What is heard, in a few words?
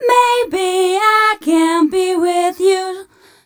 f 132 mel, Vocals, fem